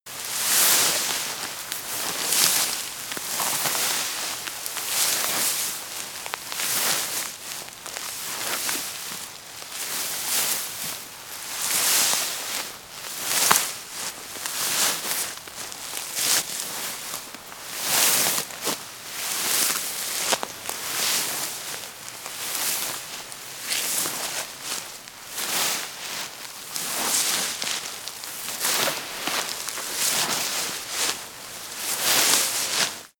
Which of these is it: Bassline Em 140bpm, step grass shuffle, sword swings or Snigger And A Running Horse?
step grass shuffle